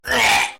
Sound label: puke